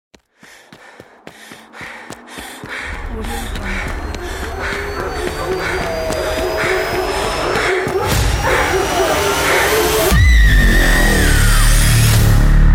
trailer intro logo

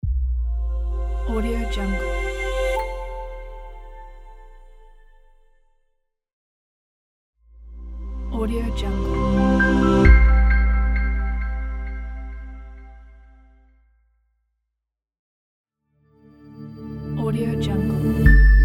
soft sting logo pack

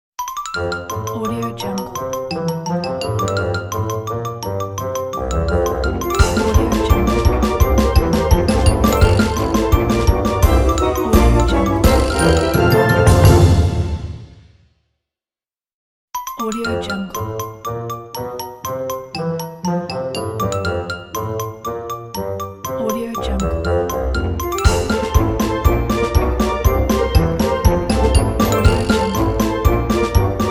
funny cartoon ident

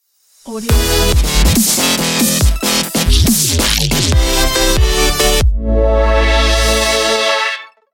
dubstep logo

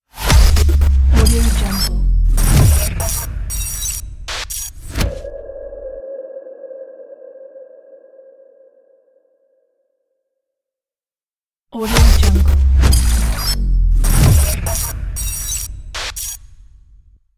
glitch logo

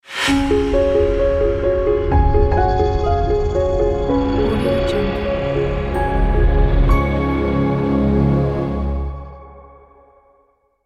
elegant logo

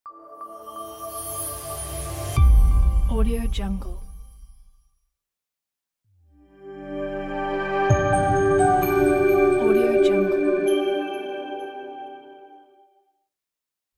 corporate logo pack

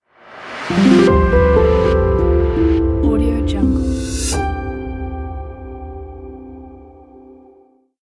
harp logo

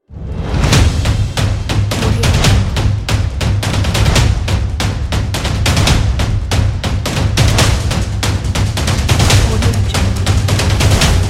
action drums trailer ident

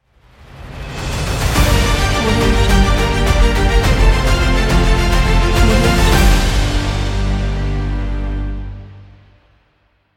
epic intro logo

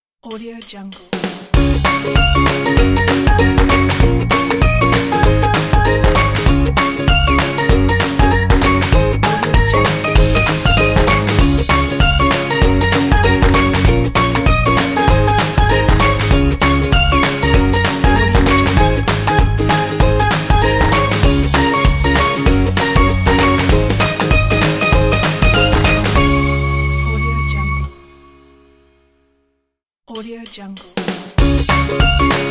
fun happy kids logo

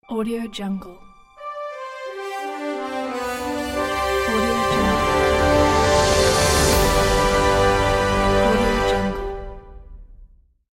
cinema logo

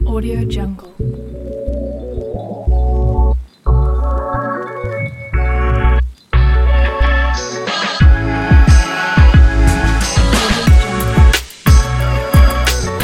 lofi hip hop beat

